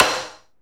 SE GAME BREAK; maimai

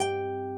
harp

